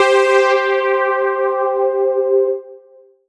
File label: Roland SC-88 Fifths Lead C5